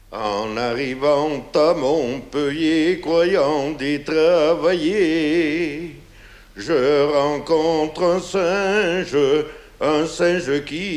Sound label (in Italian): canto; france